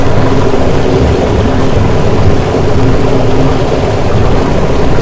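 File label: paint suction loop; Source Engine; Portal 2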